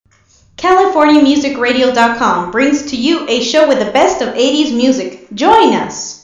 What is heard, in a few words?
Music 90s Ingles